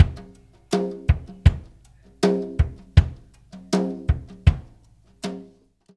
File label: Tom Groove, Laidback